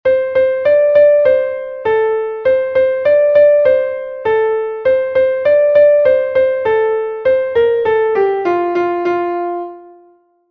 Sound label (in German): klavier; MelodieMeister